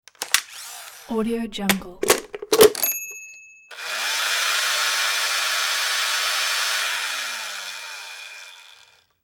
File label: robotic cooking sequence; domestic sounds